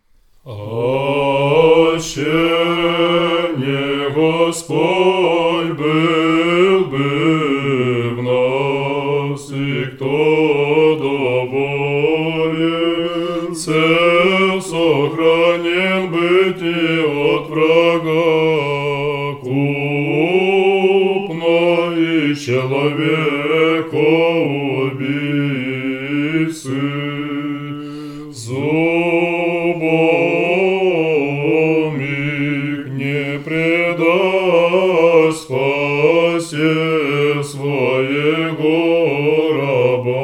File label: second antiphon tone 8